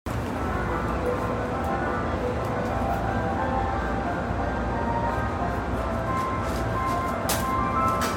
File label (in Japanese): 京浜東北線石川町北, train sounds